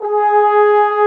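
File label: brass, FR HOR, F.Horns; Roland L-CDX-03 Disk 2; F.Horns FX+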